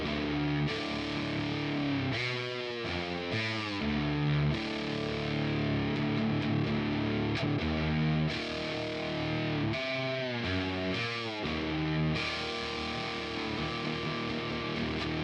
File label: Gym guitar